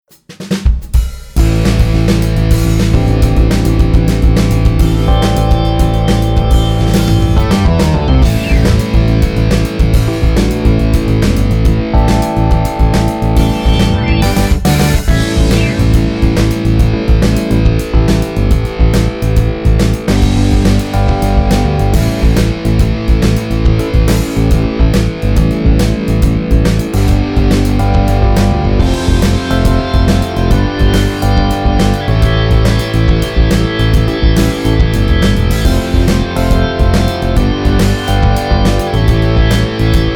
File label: Rock; ABILITY